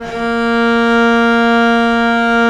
A#2, VIOLE, Historical Instruments; USB Soundscan vol.23

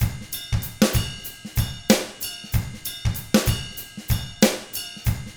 95 FUNK, Drums; ILIO; Double Platinum Drums 1

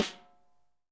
SNARES DRY; Real Mega Drums

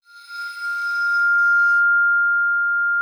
ARCO F6, VIBES; Xsample VOL-4

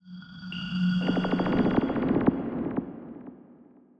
Atmos Sting; musicradar

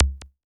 blip, Korg Electribe ER-1

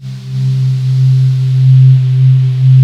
hollow pads; Pro-Rec - Synthsonic; Z1 PADS

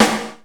Snare, EMU Proteus

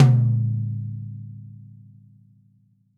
DW Green Maple Tom Room; Sonic Foundry (Sony Creative Software); Vital Drums

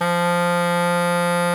kortholt F#2; SoundBytes - Early Patches

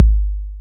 TR-808 Kick, Roland TR-808

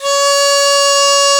Didzi C#4; Planet Earth; Digital Sound Factory Planet Earth

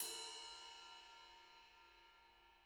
RIDE, RIDE CYMBALS; Spectrasonics - Backbeat

